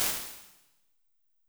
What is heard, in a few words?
Large Space, Impulse Responses; Small Spaces; Indoor Spaces; Apple